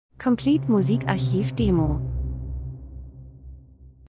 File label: transition; Transition & Movement - dunkel fliessend Angst